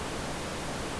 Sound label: er static